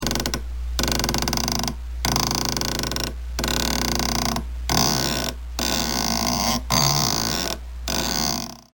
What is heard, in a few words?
Creaky Swing